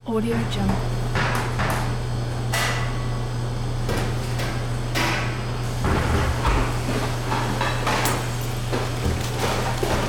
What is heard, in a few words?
restaurant kitchen ambience